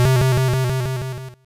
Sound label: jingle curse